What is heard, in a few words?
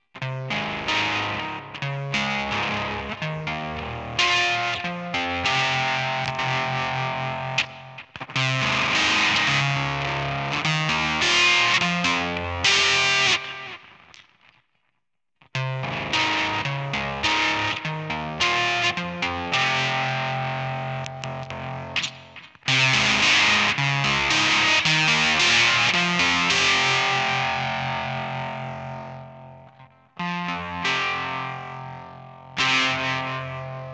octaver shootout helium; Octaver pedal; recorded from an Ibanez 2027XVV 7-string through the pedal into a Boss VF-1 effects processor that did amp and cabi